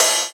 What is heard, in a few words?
Cymbal, jungle breaks drum sounds; ORIGINAL JUNGLE BREAKS